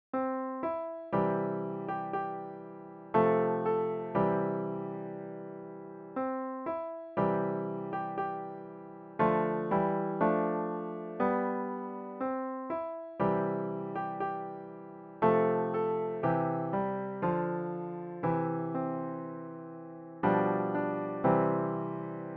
kum ba ya piano solo

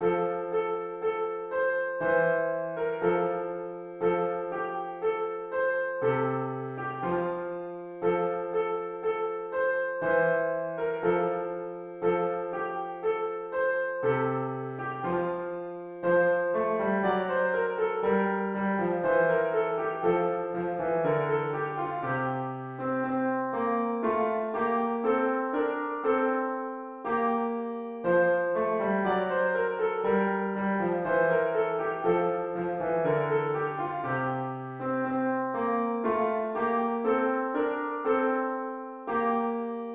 angels we have heard on high piano

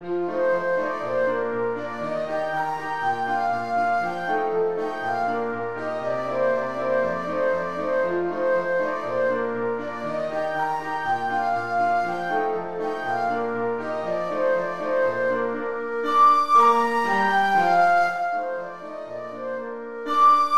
can can flute

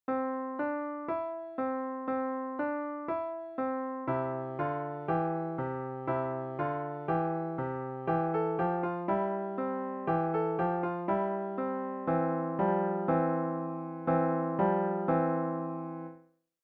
piano solo

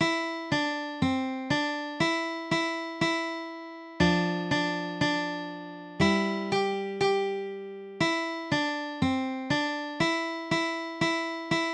mary had a little lamb guitar

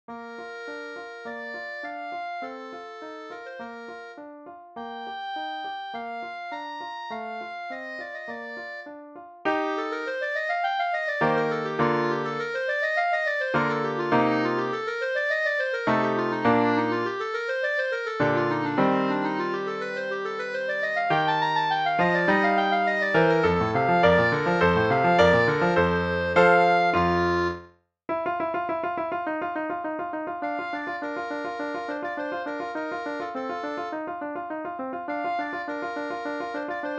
sonata in c major clarinet

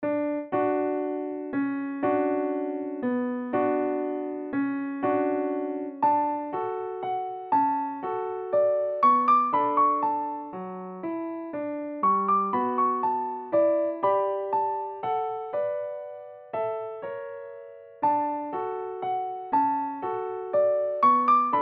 beyond that star piano

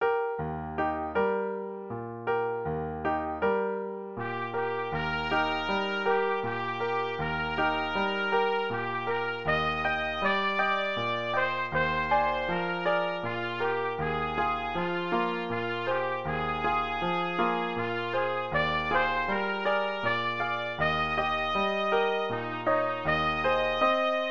brahms lullaby trumpet

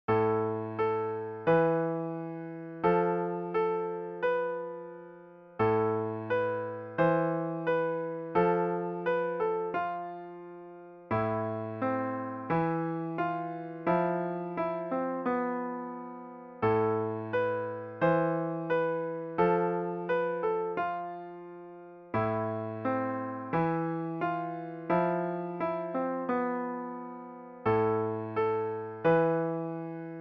sakura sakura piano solo

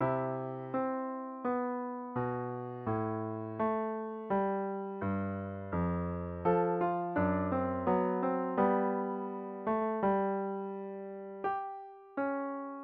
aria suite in d piano

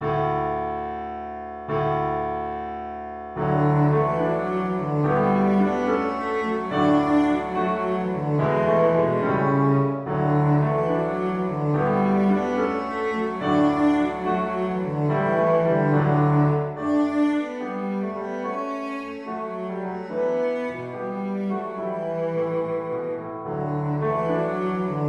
cello

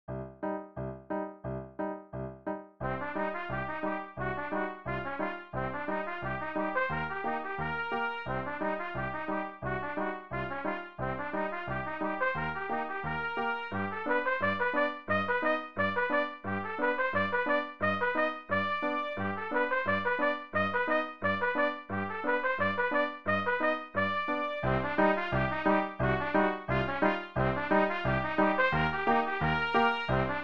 trumpet solo

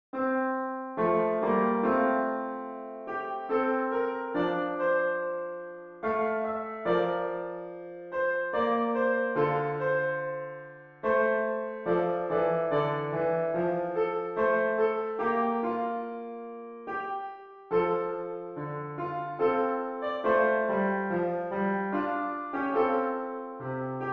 piano

